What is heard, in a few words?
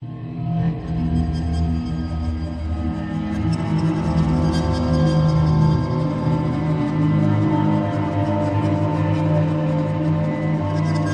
Ambient Night Crystals; musicGameplay6-4